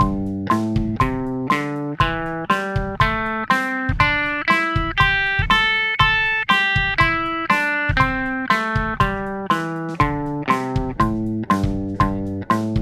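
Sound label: Am 120 bpm; A Minor Pentatonics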